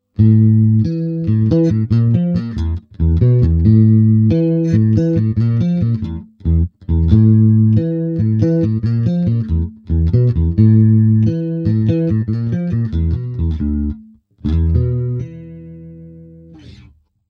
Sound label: Chorus